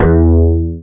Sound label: BAS TX-8020D